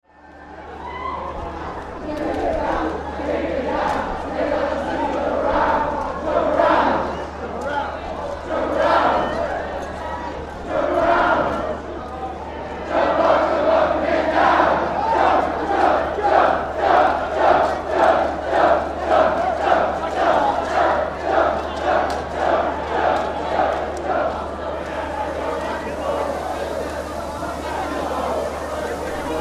Silent Disco Crowd, Large festival tent crowd, Chanting; Chanting whilst listening to DJs on headphones; Tom Hutchings